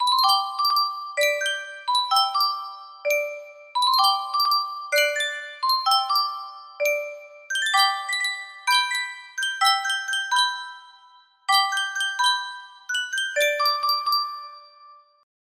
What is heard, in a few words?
Sankyo music box